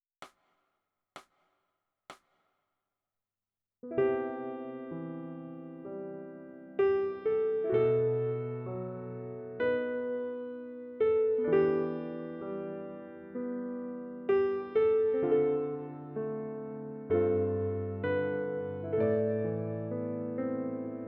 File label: Piano